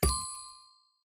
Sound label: dice win; casino